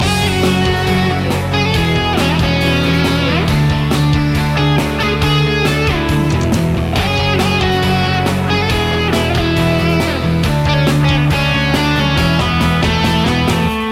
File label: encouragement loop